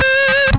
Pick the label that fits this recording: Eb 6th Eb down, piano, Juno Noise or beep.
beep